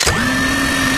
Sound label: gatling wind up; tf